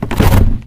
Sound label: cardboard box break; Counter Strike Model Pack; CS:S